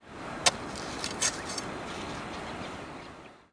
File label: flax farm; PHARAOH